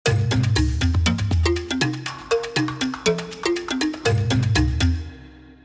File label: Jungle Link; Jingles medley